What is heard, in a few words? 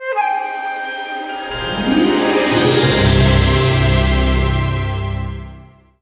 Success; epic mickey wii gamerip 2010